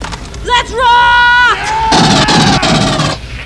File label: rock; alien